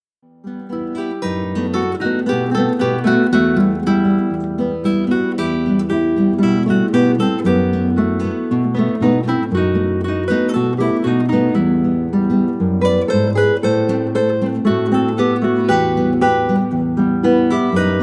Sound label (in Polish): Gitara Trio